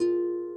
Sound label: harp